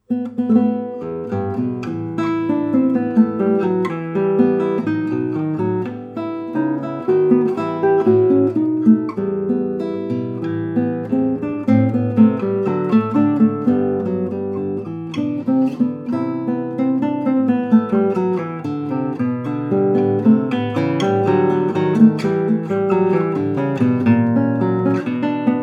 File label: Contreras double top, guitar; manuel contreras; 1984